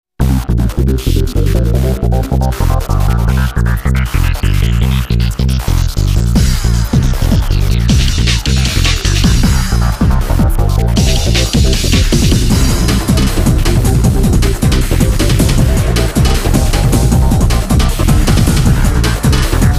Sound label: PVP READY